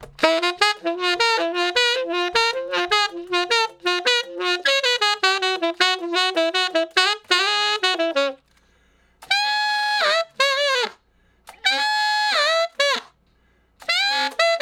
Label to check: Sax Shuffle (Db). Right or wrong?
Right